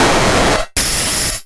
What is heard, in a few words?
Power Breath